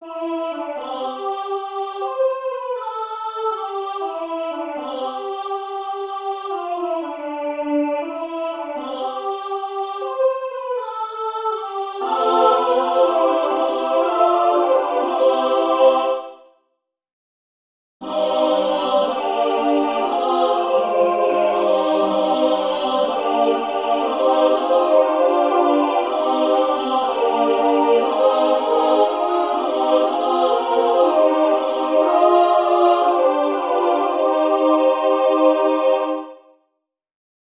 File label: midi